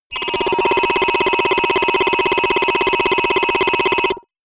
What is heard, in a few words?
notification data transfer aggressive